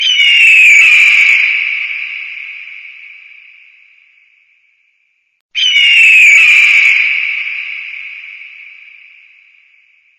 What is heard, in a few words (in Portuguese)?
aguia